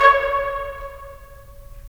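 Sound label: brass trumpets staccato, right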